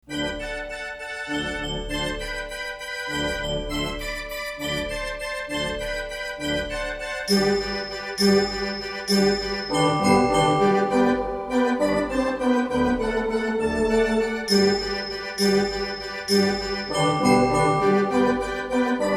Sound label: Drinking Song, theatre organs; A theatre pipe organ